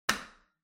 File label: Light Switch Box Rocker Hall Hard